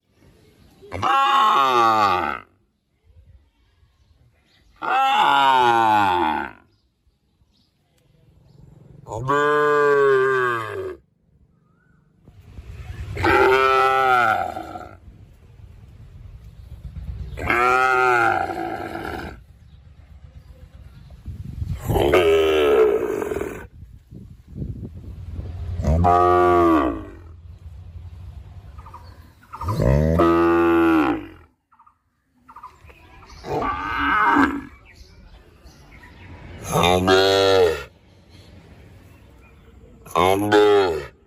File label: Cow Sound